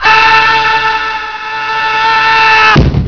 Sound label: Screaming; Holly Knocking me from 50th floor window (Rogue Bull)